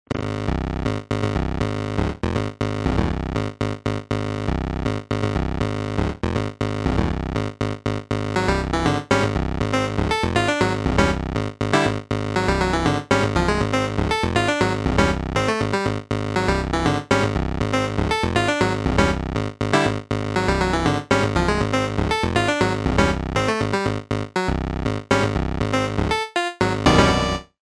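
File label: Harpsichord, electronic keyboard; dry instruments; MIDI file of a Bach piece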